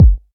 low tone bass drum single hit G sharp key

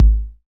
nice kick g sharp key

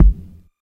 wet bass drum one shot F sharp key; kick drum; kick drum samples

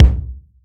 wet kick drum single shot b key

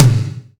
subtle reverb tom drum single hit c key

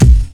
punchy kick drum single shot b key

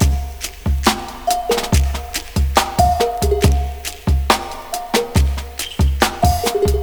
70 bpm breakbeat sample c sharp key

